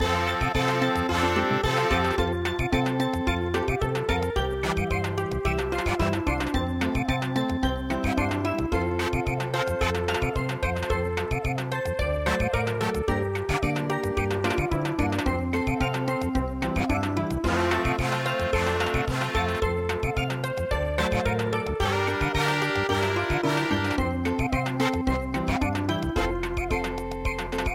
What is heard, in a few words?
Forest Cuckoo Samba